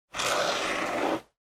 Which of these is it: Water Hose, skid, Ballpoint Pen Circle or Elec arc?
Ballpoint Pen Circle